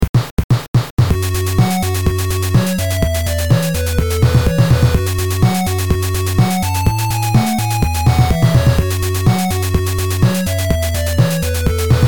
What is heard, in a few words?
NES drum test